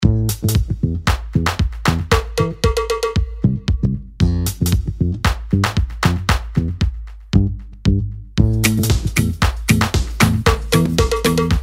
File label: DISCO